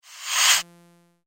Metal Blade On Stone; Sword Sound Effects; fantasy sound effect

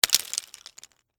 Debris Pretzel Sticks; Texture; BlastWave

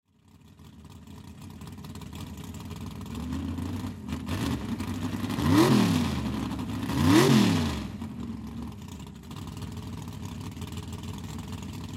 Swamp Jeep Starting Race; Transportation Watercraft

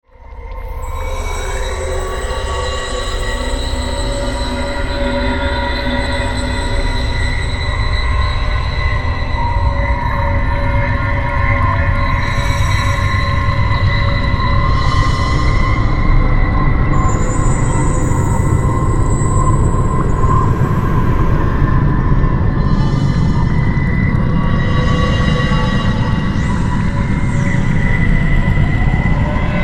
Synth, Stab, Drone, Horror, 5.1

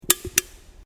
Can Aluminium Inside Bend; various metal cans; Metal (Sound Effects:Materials)

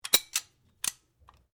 Rifle Remmington 700 7.62mm Magazine Engage; A selection of domesttic firearms; Rifles; Guns

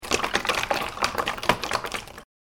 Shaking A Half Full Bottle Of Water

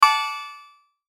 CHIME CHORD METALLIC HIGH; Multimedia; Chimes; Blastwave

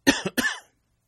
Cough Male; Sickness Sounds; Sound Effects:Human; Partners In Rhyme

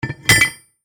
Impact Metal Heavy, Weight, Drop, On, Metal; Crashes

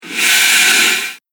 Plain Nasty Saw Whoosh; Whoosh Sound Effect; Andrew Fleming

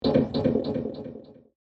Sting Choppy Delays, sound design; Stingers; Big Room Sound

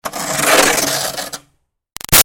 Snow Scrape Shovel By Gritty; Yard Tools; BigRoomSound

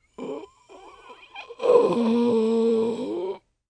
Moan Wheezy, Moan, groan, male, dying moan, pain; Groaning; (human voice)

